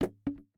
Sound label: Bottle Drop; Game rip; MiSide